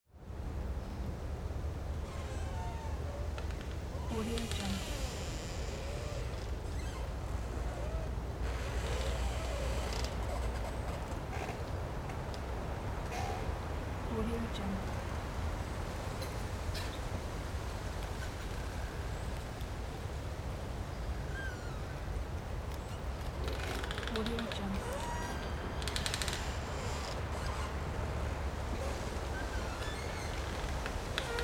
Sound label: creaky tree in windy forest